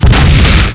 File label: explosions; hl2; impact